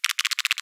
Shuffle Cards

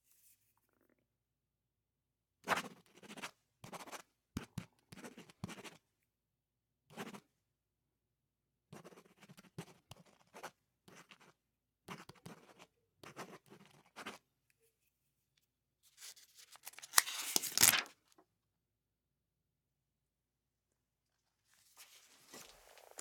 Pen Write Pad Pen Scribble Pad

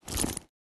Leather Bag Cloth Movement

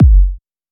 Deep House Kick Drum

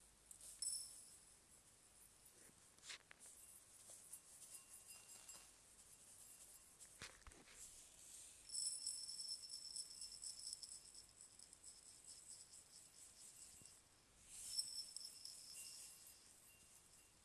Sugar Poured In Bowl

